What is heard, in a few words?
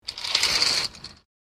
Bed curtain slide squeaky rail close; blinds